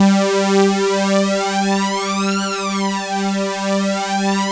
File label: PHAT ACID G4; PHATYACID